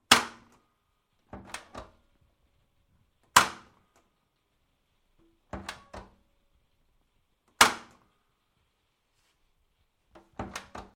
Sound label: Washing Machine Door Open and Close; Laundry; Tom Hutchings